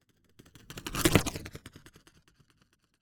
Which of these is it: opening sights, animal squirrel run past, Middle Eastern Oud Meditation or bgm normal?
animal squirrel run past